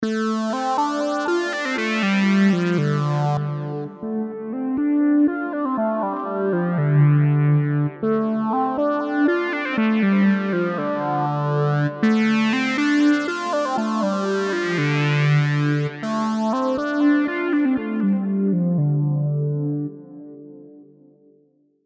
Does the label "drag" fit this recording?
No